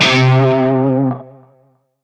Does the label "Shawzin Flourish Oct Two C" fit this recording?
Yes